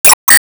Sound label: Ball Hit Drive; gamerip